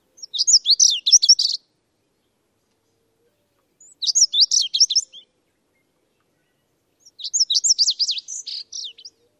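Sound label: Lazuli Bunting, song number 4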